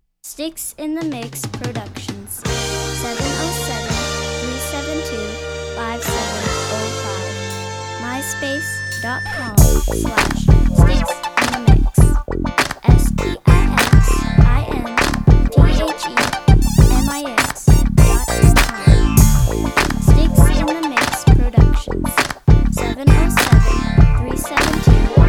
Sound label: confunky westcoast